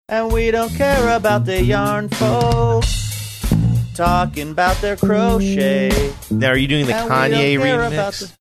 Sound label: singing and we don't care about yard folk